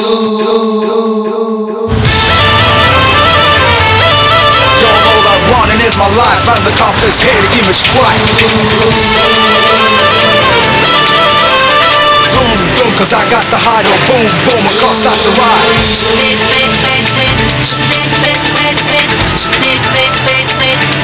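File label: background music Ringtone; Dhoom 2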